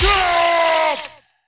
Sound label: yell; HeavenStudio; ringside